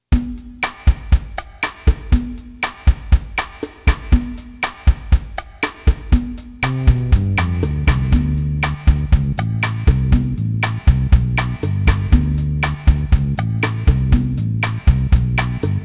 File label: No Sax Key Of D Minor